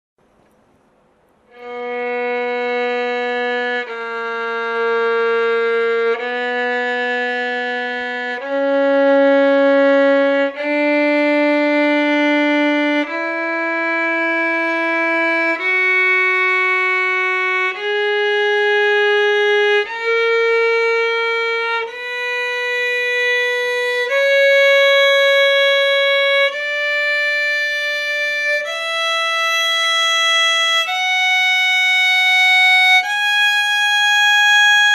scale arpegios h moll

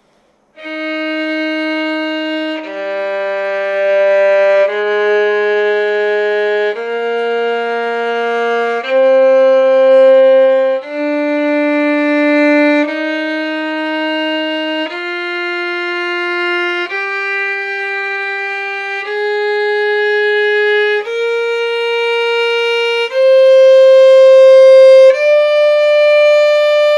scale arpegios Es dur

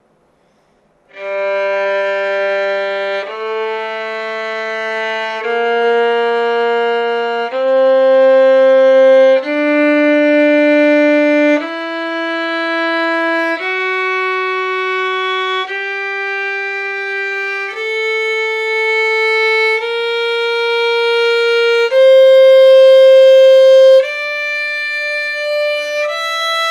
scale arpegios g moll

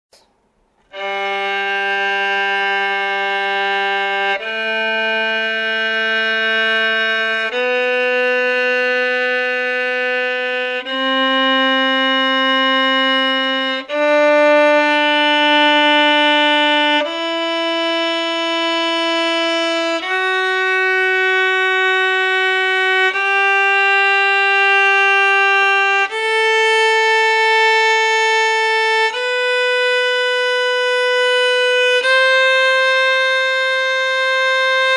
scale G dur

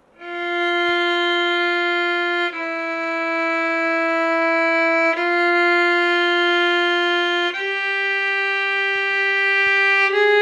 scale arpegios f moll

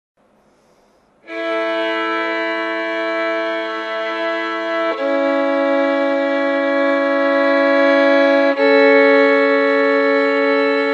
doublestop A dur

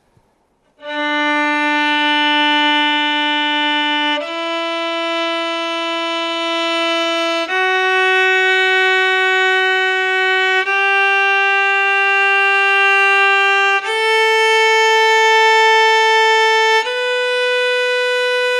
scale D dur

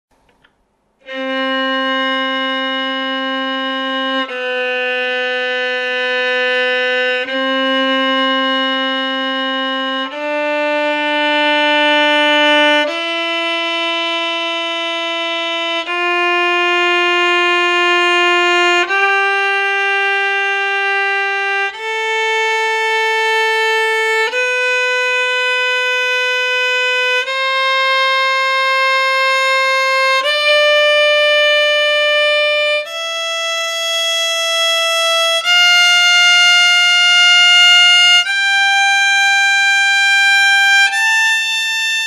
scale C dur

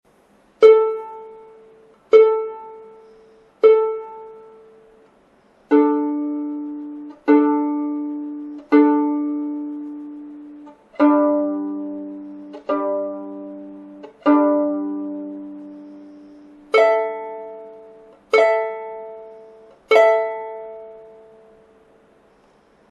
violin tuning

